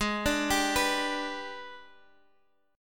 G sharp Minor Major 7th Flat 5th, Guitar Half Step Down